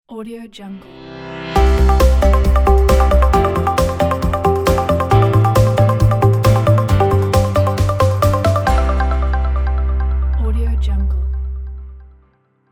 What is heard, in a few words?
bright corporate logo